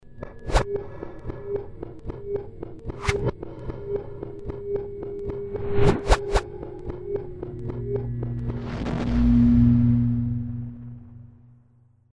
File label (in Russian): effekt vospominanii